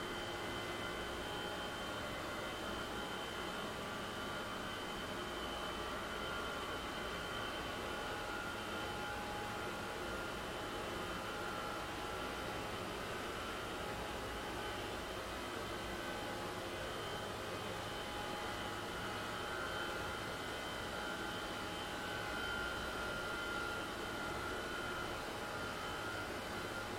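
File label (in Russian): звонкий беспрерывный шум работы производства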